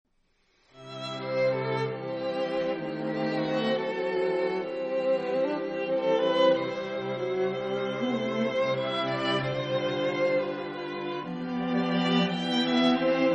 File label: Harmonie